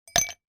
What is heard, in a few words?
ice cube drop into glass